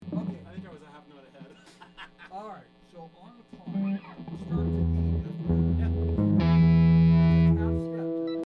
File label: Strings Only